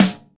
Dry Snare Sound F# Key, acoustic snare sample tuned to the F# note